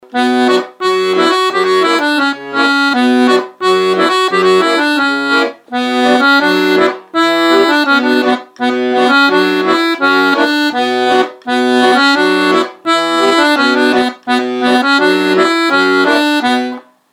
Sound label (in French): accordeon